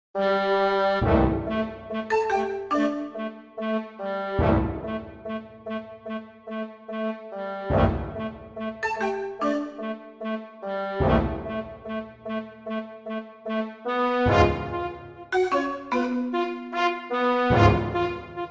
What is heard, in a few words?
china town